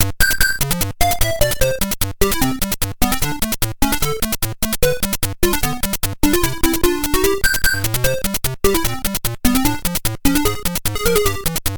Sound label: Train Levels Theme